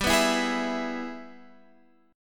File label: G sharp Minor Major 7th Sharp 5th, chord